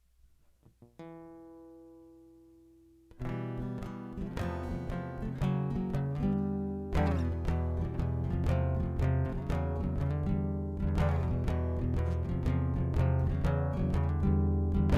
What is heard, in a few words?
Instrumental